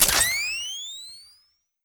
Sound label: activate; sven; hydra